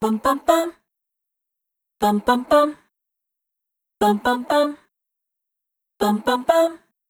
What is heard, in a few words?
Cluster - Dry, Multi-Voice Loops, Vocal Samples; Sonic Foundry (Sony Creative Software) - Pocket Diva World Class Vocal Samples